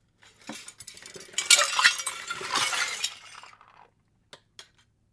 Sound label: Crash